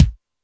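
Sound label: kick Hi Foot, Alesis D4 drum machine; 300 Drum Machines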